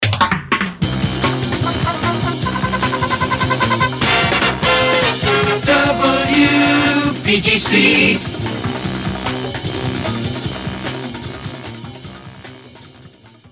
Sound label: jingles, resings long ramp; pams series 42a